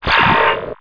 pickup; MOTS

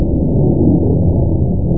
Nuke Part 2; worms2

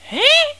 wrong; pumuckl; memo

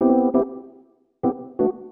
SYNTH HOUSE 125 A, Keys; eJay

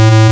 BLEEP

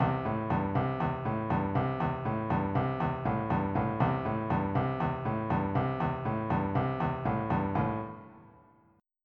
motown; Shareware Universe The Gold Collection; music

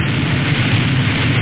jet

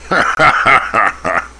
laugh; LCDEMO

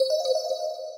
Chime; Abstract Prism; camtasia; Menu Maker

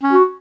Player Move

